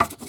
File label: Photo Crayon Env Scatter